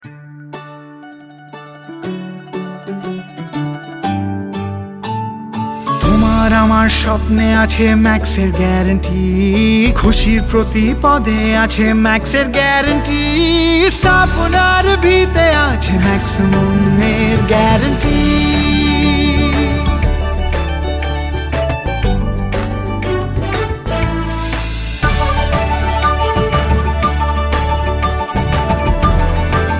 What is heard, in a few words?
Bengali Song; TVC; Max Cement